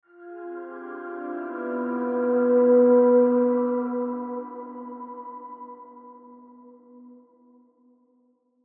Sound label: Horror Swell Mysterious; Scary Tones; Blastwave